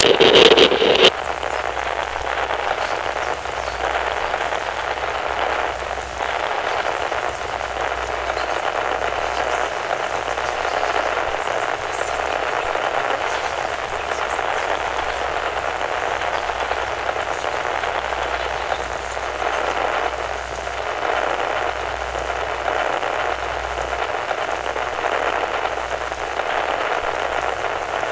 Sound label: radio scare